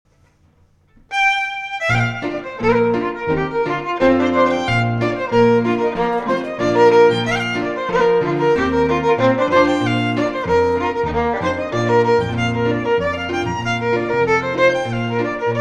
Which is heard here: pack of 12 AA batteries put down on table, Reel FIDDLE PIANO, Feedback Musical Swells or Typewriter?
Reel FIDDLE PIANO